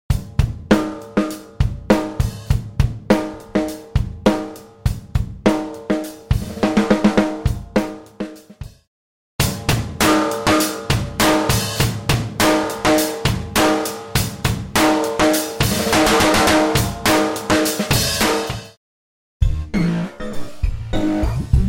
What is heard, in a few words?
Drum loop